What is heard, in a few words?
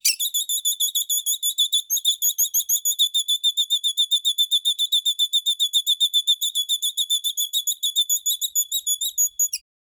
creature